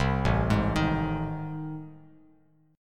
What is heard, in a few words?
A Minor Major 7th Flat 5th, Bass Standard; arpeggiated; AmM7b5; JGuitar